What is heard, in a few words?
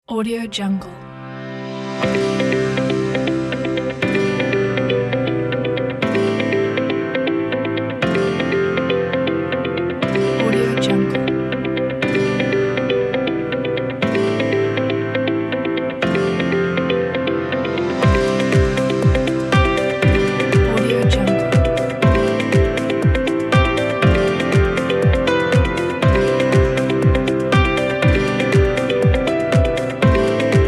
inspiring music